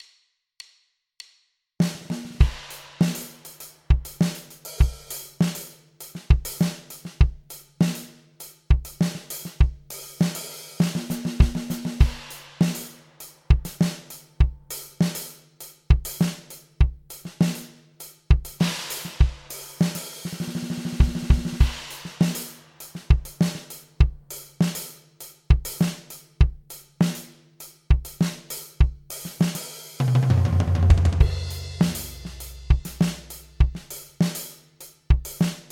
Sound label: pocket simple noshuffle